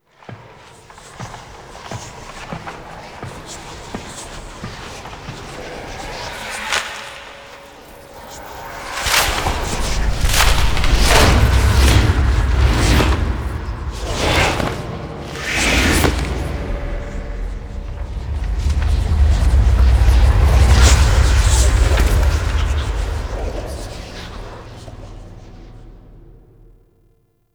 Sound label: SFX Missing Grimoire Page; Entrati Labs; Cinematics